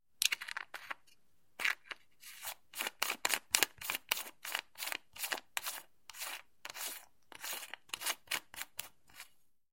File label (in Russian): zvuki podzornoi truby